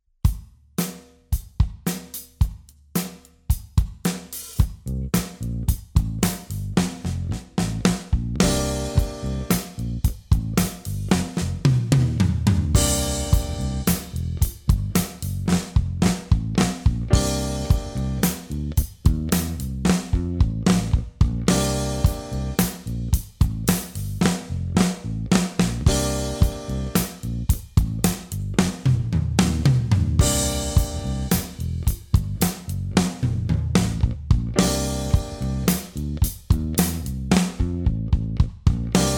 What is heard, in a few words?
Pop, Drum Fills; Essential Drum Fills; Erskine